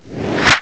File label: Move; Multimedia Designer v1.0